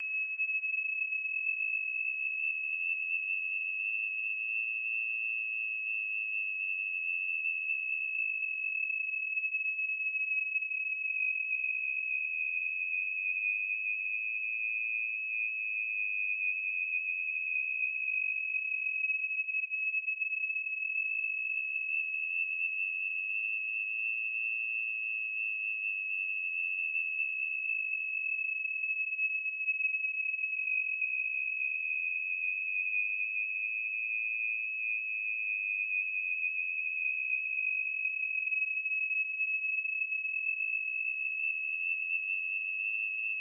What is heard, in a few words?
Tinnitus music